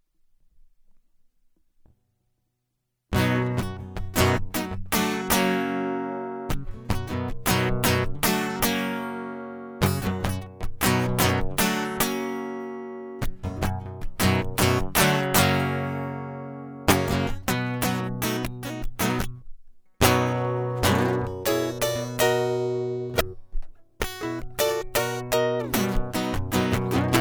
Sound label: Guitar Loop